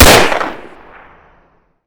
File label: Inf Weapons; Red Orchestra 41-45